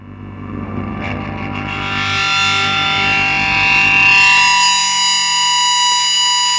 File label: Feedback, Industrial; Nasty